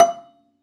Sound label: Rubbery Piano, prepared piano; Total Piano